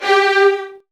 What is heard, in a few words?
Virtuoso Strings